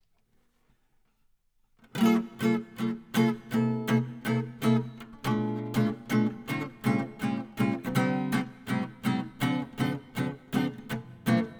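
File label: höfner 456 comping, archtops; old german archtops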